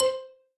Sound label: Combo Sound; Candy Crush Soda